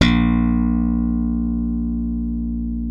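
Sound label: left thumb A#1, slap thumb; Xsample VOL-1; 90 s Sample CDs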